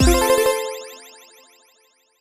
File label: medium symbol win; Hot Spin Deluxe